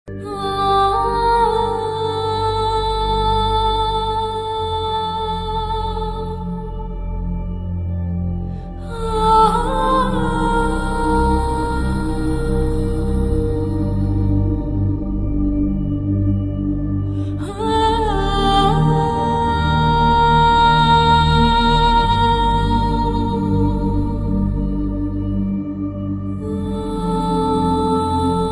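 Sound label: atmospheric melody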